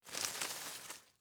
RUSTLE Studio performed green leaves twigs brush moves; Shipped Linux macOS Switch Windows gamerip 2019